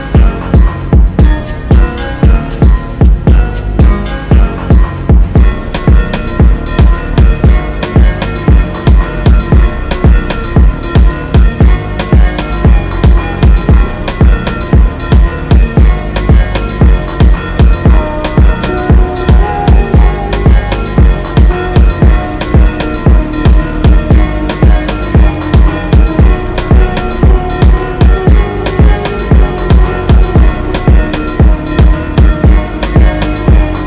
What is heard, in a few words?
Slowed Reverb